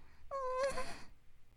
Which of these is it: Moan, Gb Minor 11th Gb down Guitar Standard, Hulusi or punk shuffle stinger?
Moan